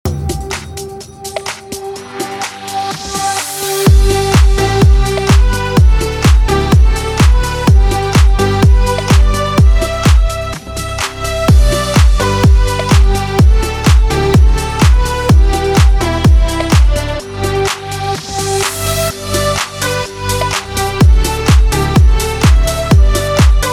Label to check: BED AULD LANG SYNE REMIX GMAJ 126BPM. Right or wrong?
Right